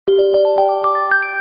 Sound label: Relaxing sound